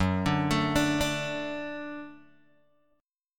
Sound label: Gb Minor Sharp 5th Gb Guitar DADGAD